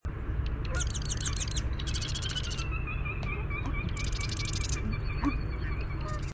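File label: Osprey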